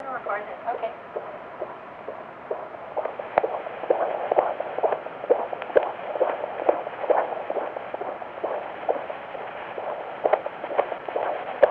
Ultrasound Heartbeat Fetus Microcassette; Spring

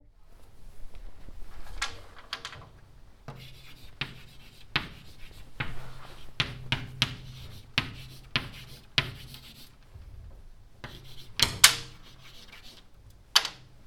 Writing On Chalkboard

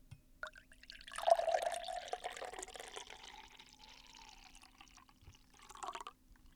Water Pour

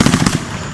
Fireworks Burst

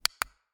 Button Click Microswitch

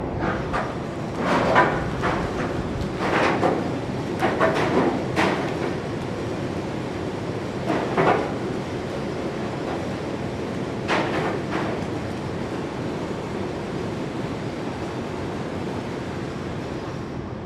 Industrial complex

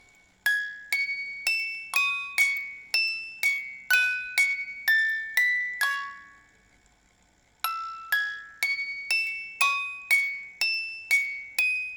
Music Box Loop

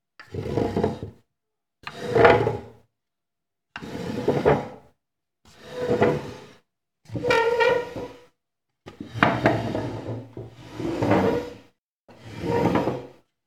Chair Stone Floor Brawl

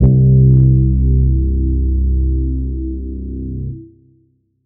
808 Dark Horror

